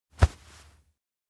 shoulder tap; fumiya; anemoi

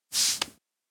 cheek stroked; anemoi

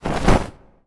cloth dynamic; anemoi

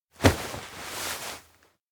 chest hold fall; aino; anemoi

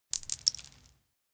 konpeito drop; anemoi パッケージ版

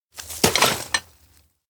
shovel takeout; kurumi; anemoi

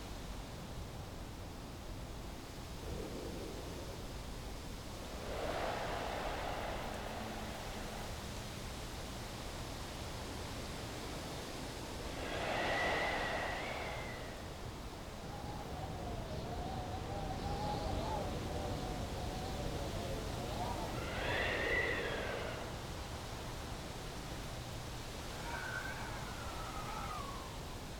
outdoor grassland night eerie wind; anemoi

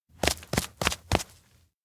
steps outdoor burst out; jet; anemoi

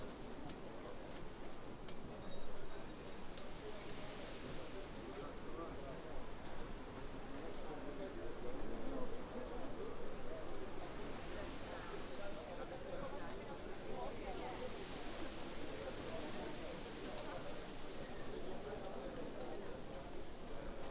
outdoor event venue chatter night; anemoi

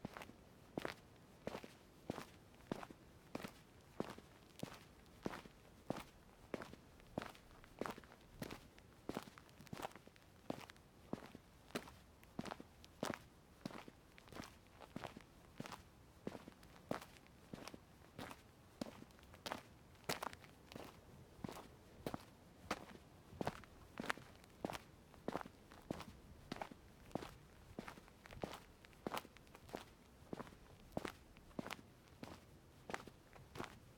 footstep basic road normal loop; anemoi

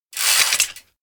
bike pedal; anemoi

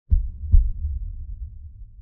pulse heart loop; anemoi

